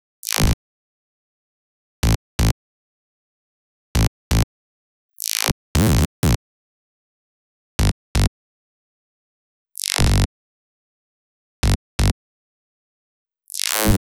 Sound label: Bass, Afterhours EDM, Electro Shock